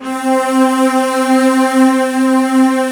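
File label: strings vox C4; VOXSTRING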